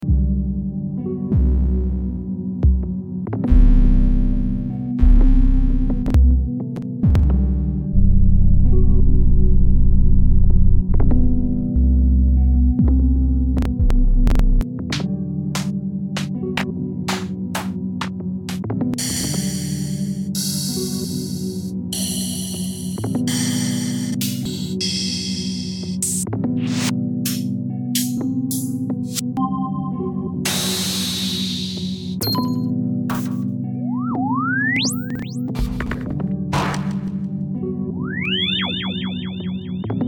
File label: drumkit; neomechanica; tutorial